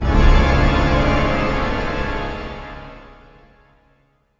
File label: Organ Shot; Encounters; Ode Pollution Tower